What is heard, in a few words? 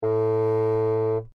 bassoon A2 forte normal